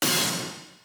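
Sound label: b stab1b, note E octave 6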